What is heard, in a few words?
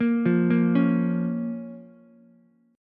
A sharp Minor, Ukulele; arpeggiated; JGuitar